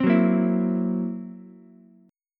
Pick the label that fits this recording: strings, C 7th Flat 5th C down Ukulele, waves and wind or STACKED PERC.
C 7th Flat 5th C down Ukulele